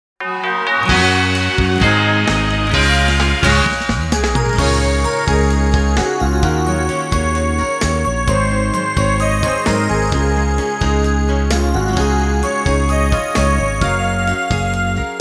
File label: Charming Chime; Suzukaze no Melt Original Sound Track; Melty Wind